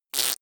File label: fake fart; koerpergeraeusche